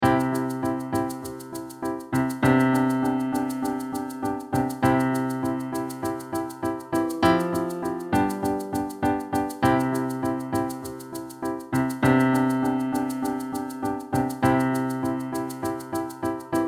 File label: beginner backing track 90 bpm